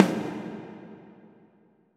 Reverb Transient

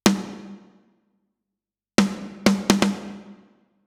Snare Cinematic; Snare Process

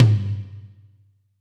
Tom Rack Stereo

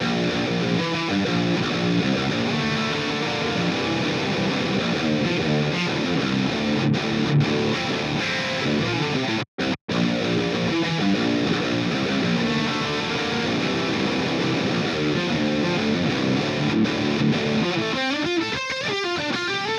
Guitar Smart Comp to Amp

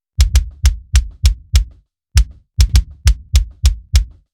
KICK ON

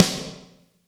Snare Process Sample

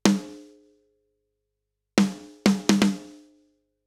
Snare Process Soothe

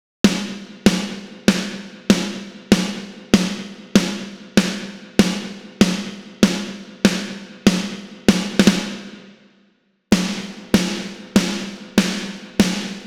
Snare Repeat

